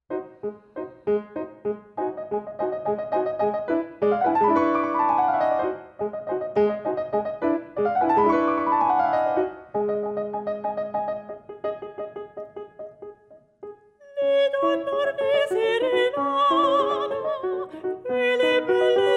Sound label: Mandoline